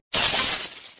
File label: recharge Success